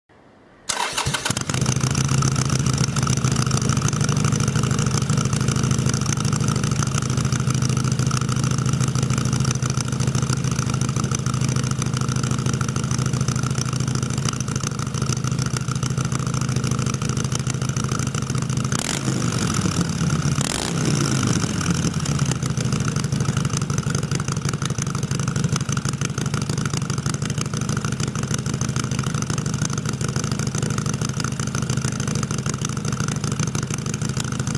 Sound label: Zard Exhaust Sportster, motos, echappements